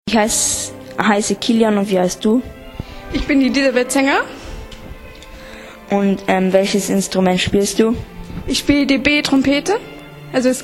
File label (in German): B Trompete; culture kids